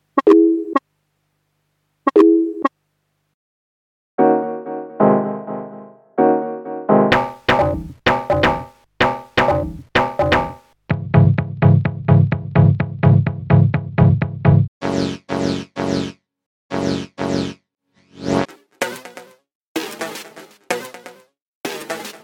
Synth; Boot Sector

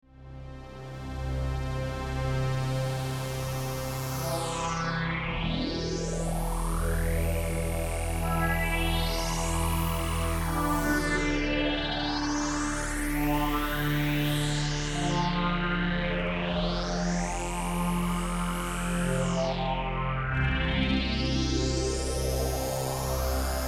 deep pad

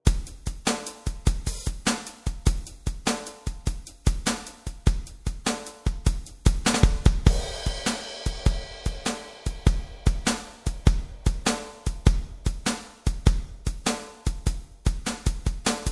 Drums

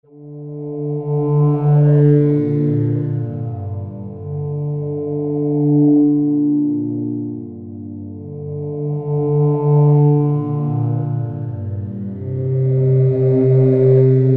Bass PAD Macro Modulation; Heavyocity Mosaic; Slow Talker

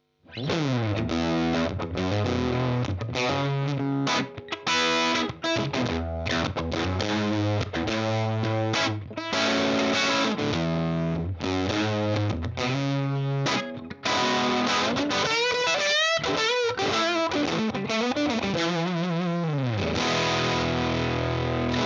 Woodrow 55 GB25 EP III Boost Room

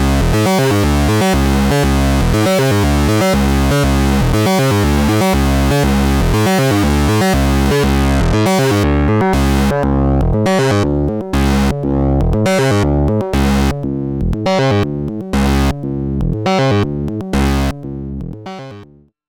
Classic Sync Sound; Reon RE501 RX Driftbox

